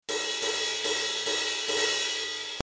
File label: interpretive drums